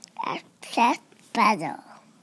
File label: abstract puzzle